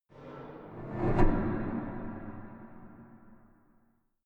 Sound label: impacts